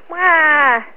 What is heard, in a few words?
wah